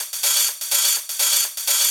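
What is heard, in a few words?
drum loop 125, hh+filterloops; PS-28 Vocal House Samples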